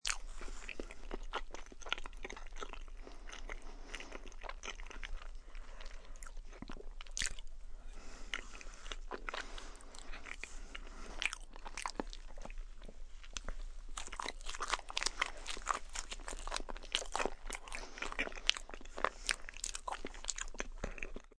Eating Soft Wet, Eating Food, soft, chewy, banana, peach, lots of mouth noises; Human; (human voice)